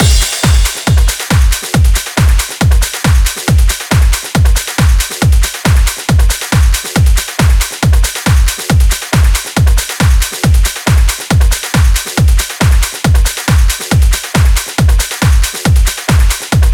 Drum Mix 16bars 138BPM; Drums & Perc; Gminor

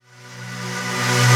FX Athmosphere; Vengeance Samples

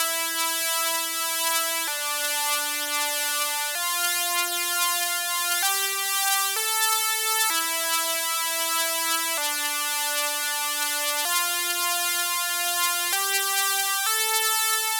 Notice Melody 3 Root D, 128BPM; Vengeance Samples; Notice Kit

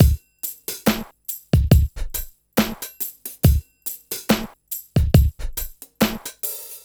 Slomo Beat 140 BPM; Vengeance Packs; vengeance essential club sounds-3; VEC3 Loops 140 BPM; VEC3 Slow Motion Beats 140 BPM

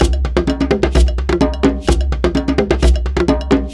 Latin House Loop 128 BPM; Vengeance Samples

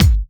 Bassdrums

